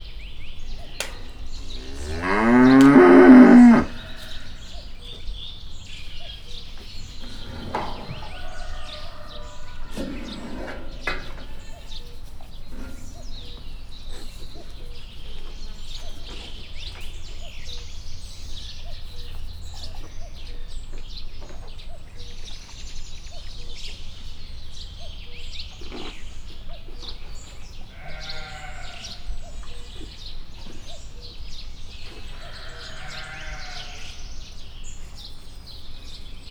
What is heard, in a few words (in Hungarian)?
parasztudvar, magyar szürke szarvasmarha; miskolc zoo; miskolci zoo 2018